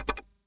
delete key, keyboard

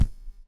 click soft

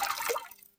dissolve, transition

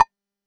command execute, terminal

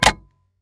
brand stamp, impact

